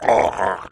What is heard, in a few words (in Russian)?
udar